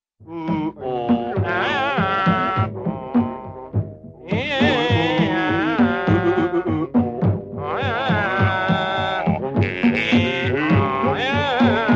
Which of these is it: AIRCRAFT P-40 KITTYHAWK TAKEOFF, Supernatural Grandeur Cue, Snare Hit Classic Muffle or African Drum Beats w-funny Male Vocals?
African Drum Beats w-funny Male Vocals